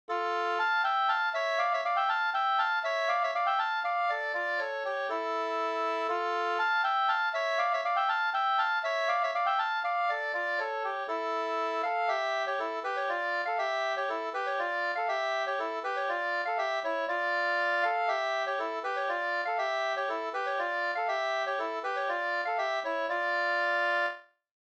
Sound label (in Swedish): slangpolska